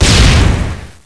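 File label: grenade explosion; cstrike; codmw2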